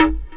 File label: techno, percussion techno; Ueberschall; Techno Trance Essentials; DRUMS